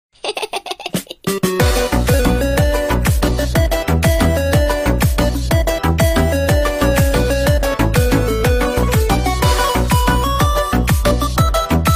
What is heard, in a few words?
fathers day music